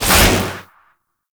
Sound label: Cloak Pulse End; Powers; Warframe; Focus; Operators